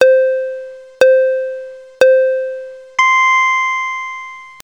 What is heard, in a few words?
timer